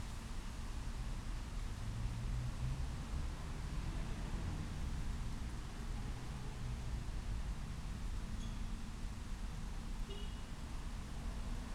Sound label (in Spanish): casa reloj reloj